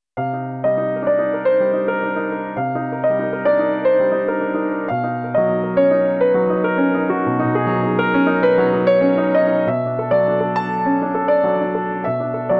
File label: solo; Eigenkomposition